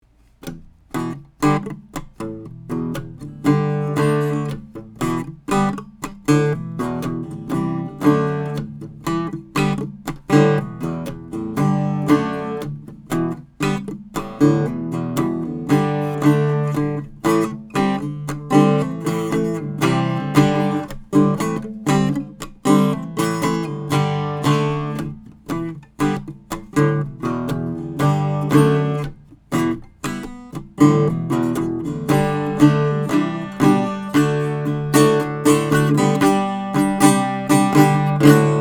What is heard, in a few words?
Harmony Swamp; 1930 Wards Airline flattop; Metric Halo ULN-8 converters; Cardioid Pattern; sE RN17; 17mm Capsule; Modular Capsule System